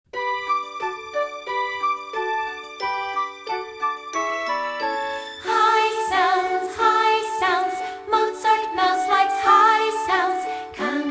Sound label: high and low song, rhythm ensemble